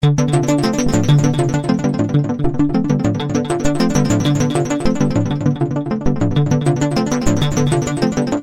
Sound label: wave seq, evolver